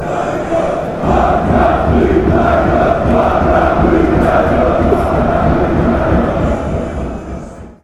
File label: panathinaikos chant